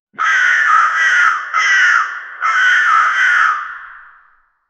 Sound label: a massive crow monster, monster